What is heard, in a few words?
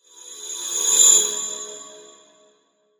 Whoosh Electronic, Pass By, Transition; Production Element, Imaging Element, Accent; BlastWave